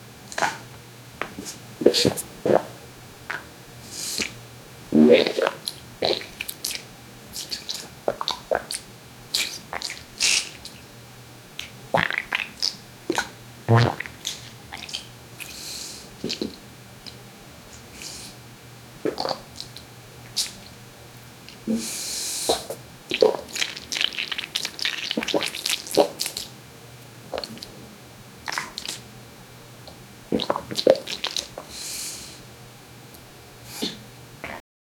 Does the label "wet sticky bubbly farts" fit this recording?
Yes